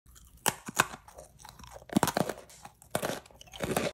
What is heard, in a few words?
eating pretzels